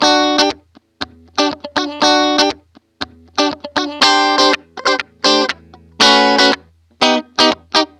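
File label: GIT-CHRDLICK, guitar chord lick, Disco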